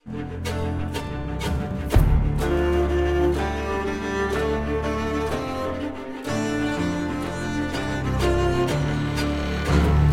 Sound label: music; lol; bilgewater; all random; ban phase; butchers bridge